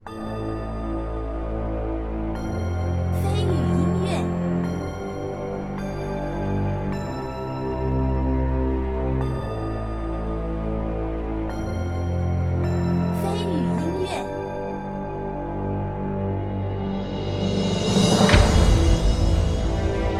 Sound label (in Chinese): 大气 磅礴 恢宏